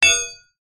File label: impact metal dowel thin on concrete post